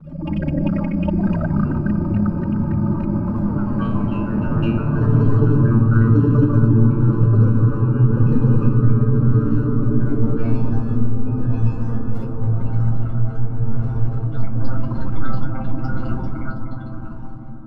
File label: Submerged Metallic, Dark; Zero-G Ambiosis